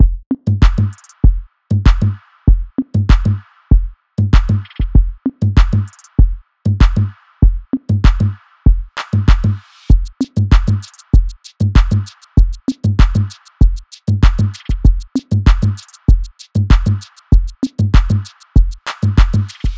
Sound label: Drum Loop 97 BPM; Outside The Box; Cymatics - Infinity Beta Pack 2.0; Infinity Drum Collection